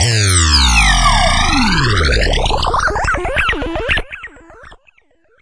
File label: descending wobble synth hard